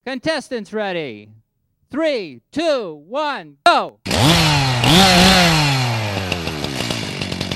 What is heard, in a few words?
ringtone